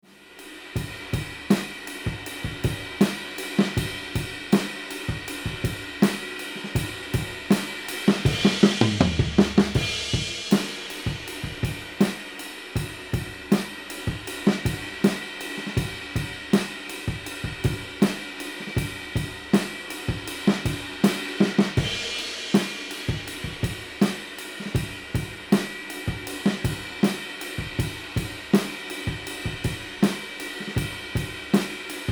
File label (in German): Melodium 42 B Echoschall Drums Room